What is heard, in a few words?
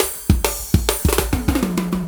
Warp Drive Beat, Processed Drumkits